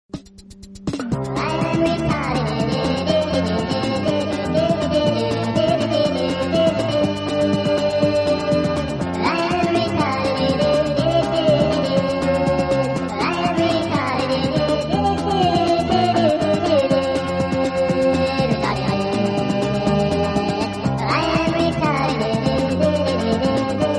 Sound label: test music